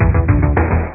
bass guitar

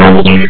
Death Quit; 300 games for mac; Arcade; JetFrog 3.0.4 c

